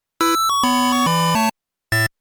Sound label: gameover, 8bit